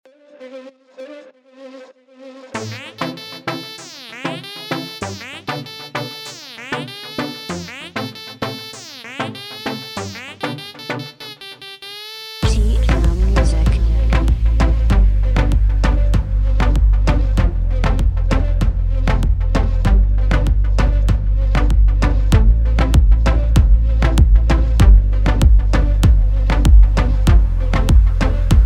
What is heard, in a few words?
RIDDIM Instrumental